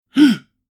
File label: Scared senior male shout